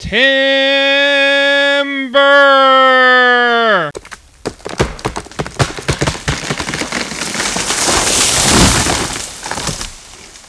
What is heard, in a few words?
timber; Hodj 'N' Podj; Expert Software